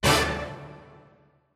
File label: Quiz Question